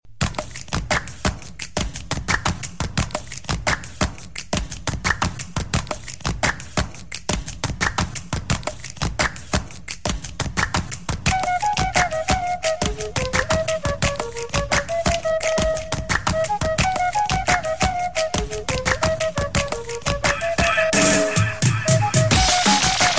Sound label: Flute Tune